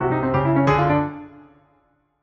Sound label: failure piano a dry; sonniss gdc